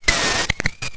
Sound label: Miscellaneous Glitch